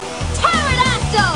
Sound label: Morph; Mmpr